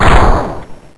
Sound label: fnh fire sil; weapons